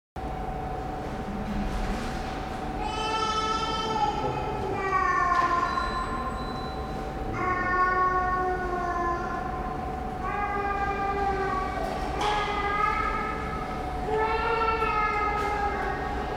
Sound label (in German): Klangvolumen, Vending machines provide a constant hum, coloring the acoustic character of the space; Soundwalk; A Soundwalk for Klangspuren Festival 2025